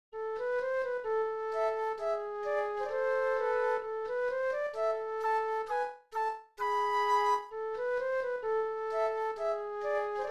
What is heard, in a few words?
flute duet; classical